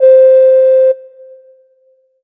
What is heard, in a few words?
Ensoniq ZR-76 Ocarina C5